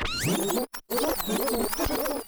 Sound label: back spin long, vinyl record